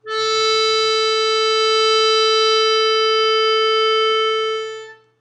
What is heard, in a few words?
Accordion A dry